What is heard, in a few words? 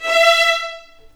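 E5 violins; The Ultimate String Collection; East West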